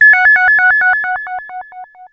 ARP 2600 A3, synthesizer; Hollywood Edge - Giorgio Moroder Rare Synthesizer Collection

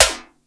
SNR, PLASTIC DRUM; 90 sSampleCDs; Crypton - DrumBase5000

